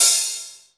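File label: hi-hat open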